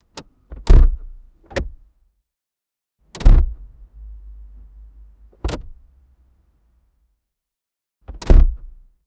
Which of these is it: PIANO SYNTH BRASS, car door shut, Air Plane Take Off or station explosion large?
car door shut